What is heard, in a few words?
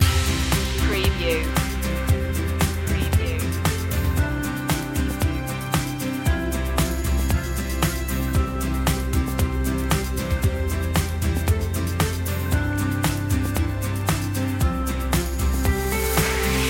time with you loop